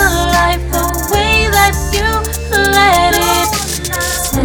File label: prechorus no adlibs